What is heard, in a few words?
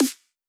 snare f#4